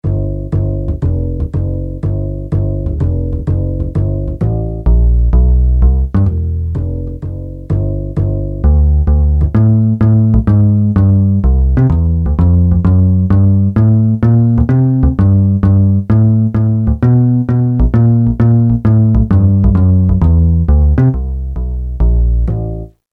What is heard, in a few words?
jazz bass; sampler; Guess the sampler game